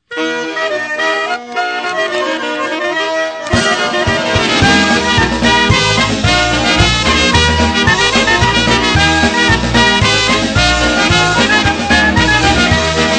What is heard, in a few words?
Chicago style polka